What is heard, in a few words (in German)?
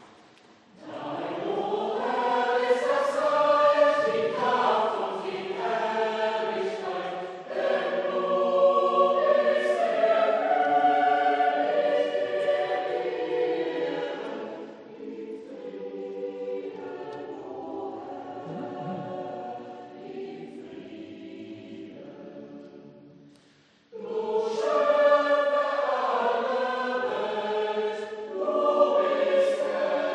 Chor; gib Frieden